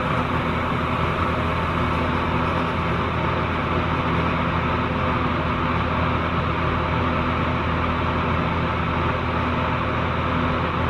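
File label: ventilation fan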